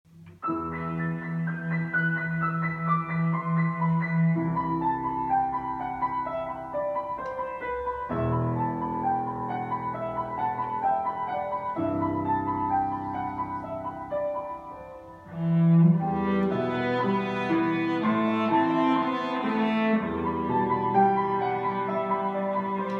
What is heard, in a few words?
Cello Piano; O Come, O Come Emmanuel; Christmas; Congregational Church of the Peninsula